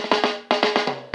JAMMAR FILL; AMG Gota Yashiki Groove Activator; JAMMER 120

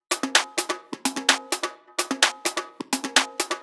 Drumloops Beat 128 BPM ohne BD

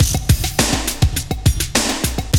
Loop, Roland; Sound & Vision - Gigapack I

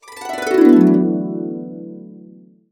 D, Harps; USB Soundscan vol.62; AKAI

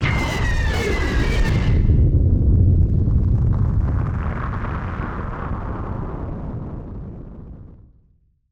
Firework FX; musicradar; bomb factory

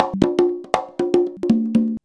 CONGA 119; USB Soundscan vol.56 - Modern Percussion Loops; AKAI

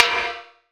Perc, Gated Reverb; musicradar

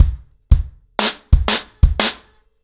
90BPM, acoustic drums, drumloops; The Roots Drumloops

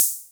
Cymb, Clavia Micro Modular

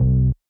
Sub Dub Bass; Big Fish Audio; Old Skool House; OSH Bass

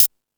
More Cymbals, Drum Machines E-MU Pro-Cussion

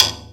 STAKE, PERCUSSIVE; Rarefaction; Poke in The Ear With A Sharp Stick

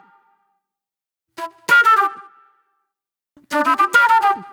Agro Fusion Flute

